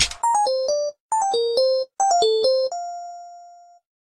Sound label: Nokia Tune